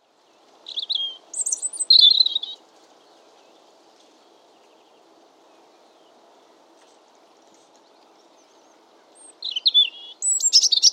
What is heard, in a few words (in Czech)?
cervenka obecna Erithacus rubecula